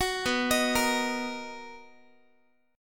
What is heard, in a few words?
B Major 7th, B, down, Guitar; BM7; arpeggiated; 21,19,18,20,x,x; 4A,4E,5A,5D,5G#,6C#